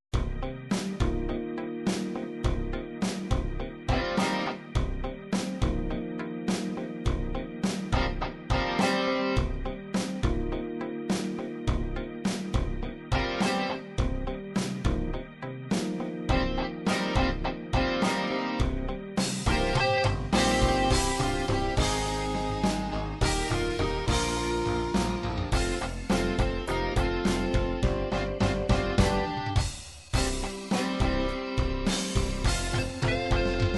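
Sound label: 8 rock ballad, midi; 8 bit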